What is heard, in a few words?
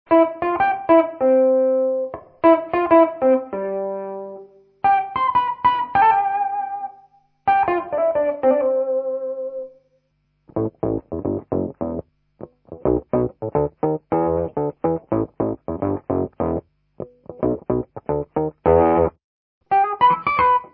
green ringer